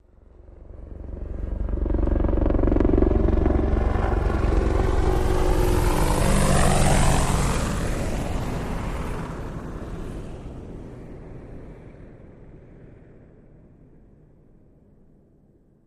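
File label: Apache By Medium Distant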